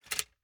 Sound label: Gumball machine, metal, glass, candy, switch, mechanism, rotate, jammed, hyper; Eiravaein Works; Vaeyan IV